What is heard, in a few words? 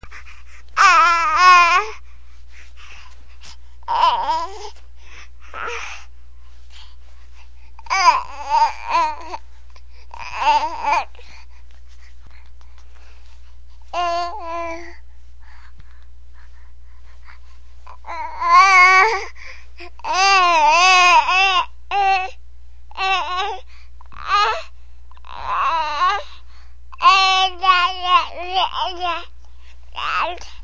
baby talking; people